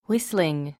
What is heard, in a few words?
wo whistling